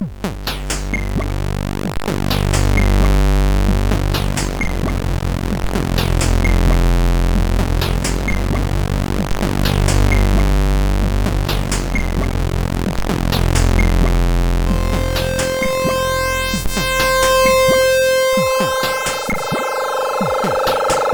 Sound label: Rythmic Sound, CSG8580R5